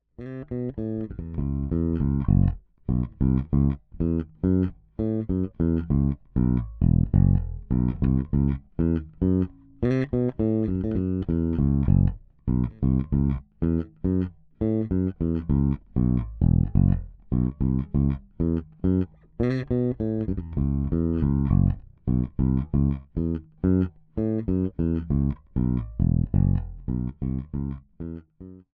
Sadowsky NYC Jazz Both Pickups passiv

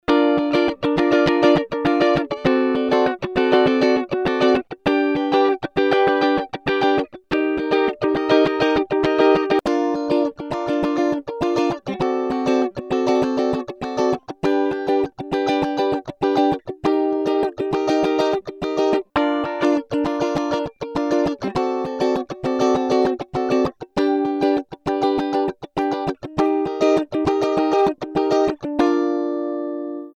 E Uke DI Traditional Hals beide Bridge

